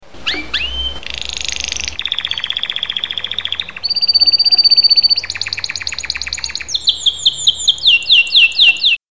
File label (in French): canari